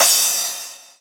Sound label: crash fastforward